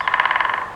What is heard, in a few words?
Raptor Idle